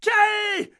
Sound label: cry; True Crime Streets Of LA; XBOX